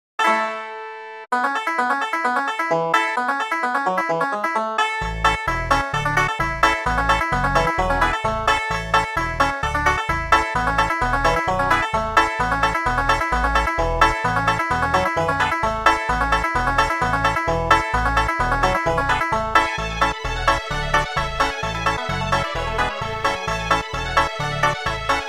Old Time Appalachian Folk Song Banjodoline Banjo Strings; Syntheway